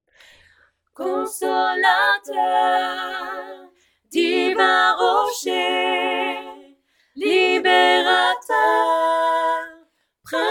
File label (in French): Merveilleux nom pont 3 voix